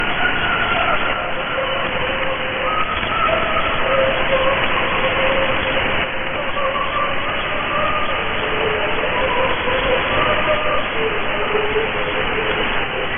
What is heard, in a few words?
HAARP Ghosts